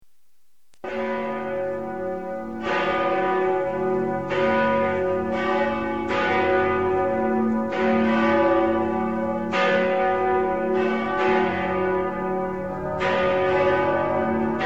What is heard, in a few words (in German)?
sext glocken, gregorianische gesaenge; Marienkirche, Danzig, Polen